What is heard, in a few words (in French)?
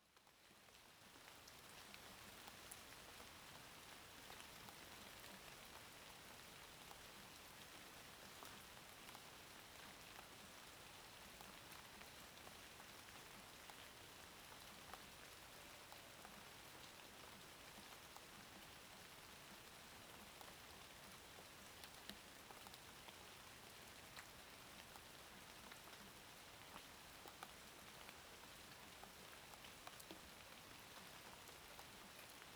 pluie beaumont